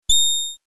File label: classic gold; spelunky classic